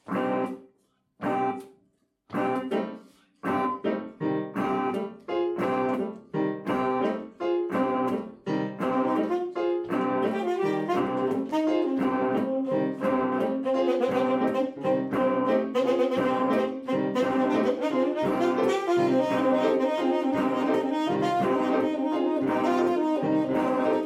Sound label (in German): Modale Impro